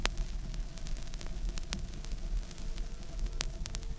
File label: Vinyl, noise